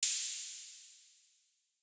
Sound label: menu